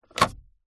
vehicles car hyundai trajet 12v power socket compartment drawer close; 2006; zapsplat